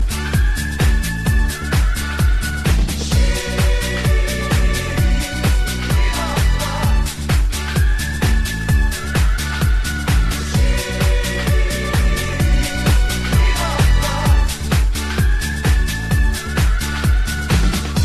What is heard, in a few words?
House Music